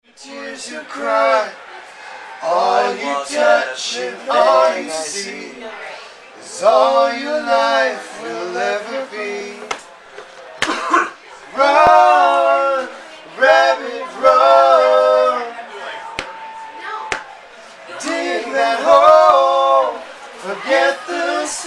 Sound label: Vocal Rehersal